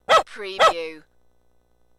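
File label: Dog Barking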